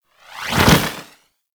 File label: Dragon Pelt Idle Wing Flap